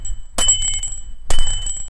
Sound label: wrestling ring bell